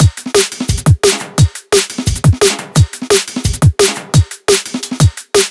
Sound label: Drum Loops, 174; M8; Electronisounds